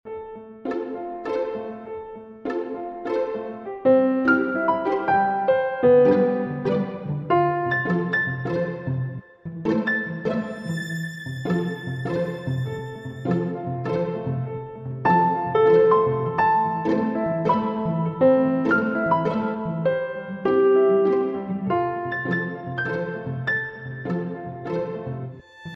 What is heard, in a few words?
musica clasic piano